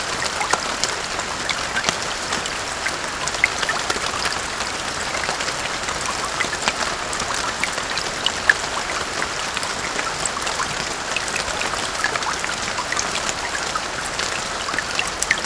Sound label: Rain Puddle